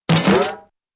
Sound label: bee boomp